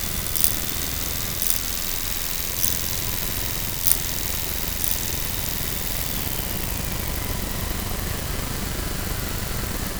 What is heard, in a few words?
knock